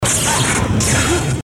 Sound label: high voltage